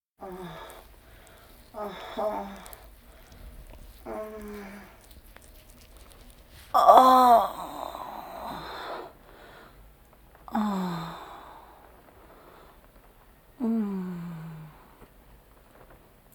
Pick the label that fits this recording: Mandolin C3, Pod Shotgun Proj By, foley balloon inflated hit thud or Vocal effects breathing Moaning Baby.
Vocal effects breathing Moaning Baby